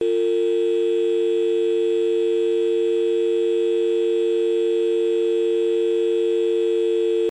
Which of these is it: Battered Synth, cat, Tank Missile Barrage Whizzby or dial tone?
dial tone